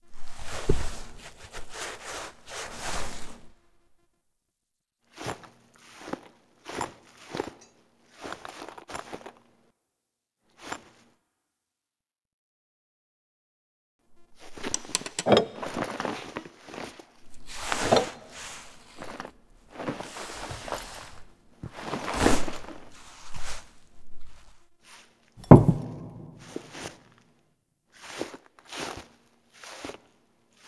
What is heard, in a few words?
Table For Two Foley; Cinematics; Zariman